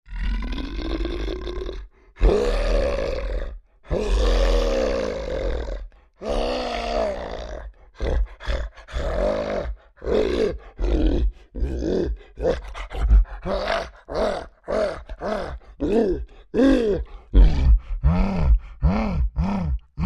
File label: Creature Large Excited Panting; Monsters; Horror; BigRoomSound